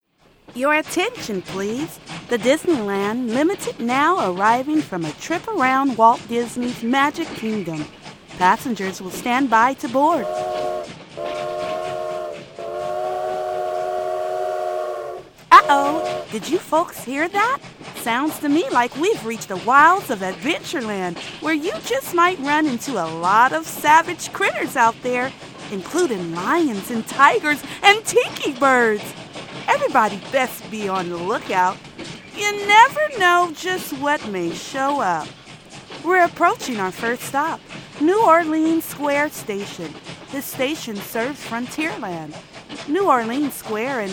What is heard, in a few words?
outdoor soundcape; en-US